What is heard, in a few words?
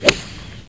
GOLF SWNG